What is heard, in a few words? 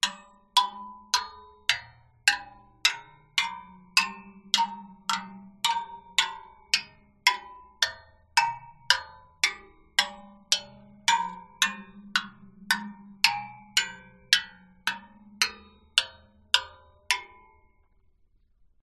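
waterphone drumstick hit rods condenser; aston origin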